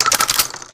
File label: shells pickup; cdogs